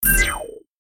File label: reply send; FightingGames; TWBlue